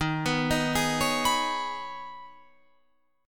D sharp 7th Suspended 4th Sharp 5th, Guitar, Open F; D#7sus4#5; arpeggiated